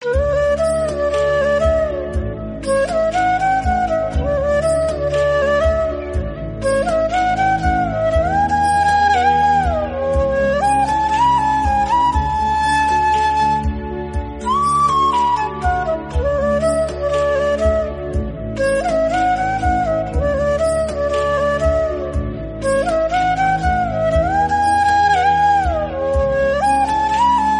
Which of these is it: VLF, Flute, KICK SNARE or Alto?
Flute